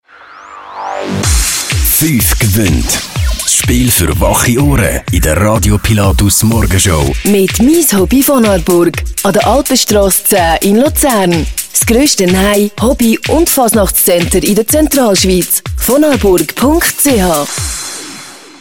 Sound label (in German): Werbe jingle; radio pilatus